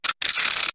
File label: glitch; fertig classic games